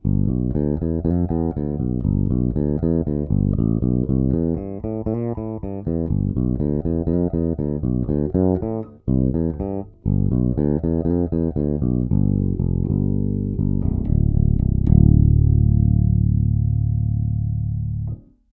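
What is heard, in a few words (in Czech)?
Warwick RockBass Infinity 5 Fretless, Thomastik JR345, dvojcívka sériová - nic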